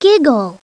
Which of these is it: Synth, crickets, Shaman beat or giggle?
giggle